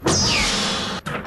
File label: cockpit open